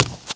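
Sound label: run right medium, foot; The Elder Scrolls III - Morrowind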